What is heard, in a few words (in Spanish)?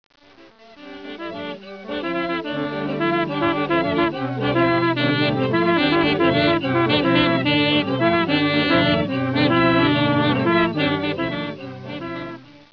flautas; Etnologia